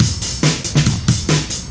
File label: LoFi Beats, 140 BPM